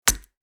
Metal miniature game board map single move

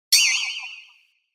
Anime fail accent

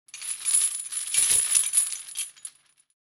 Prison jail prisoner transfer chain metal rattle

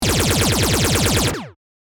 Sci fi weapon machine gun bursting

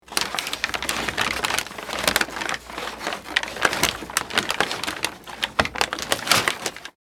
Christmas toys wrapping paper long; gfxsounds

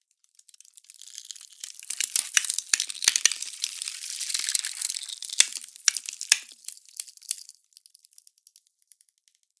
Creative ice cracks

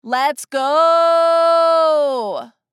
Lets goo female cheering; cartoon voice; gfxsounds

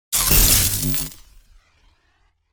Electrical energy Impact explosion burst; shockwave

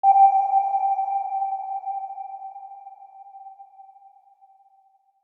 Submarine sonar ping scan single beep

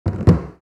Bodhran brief rolls; gfxsounds

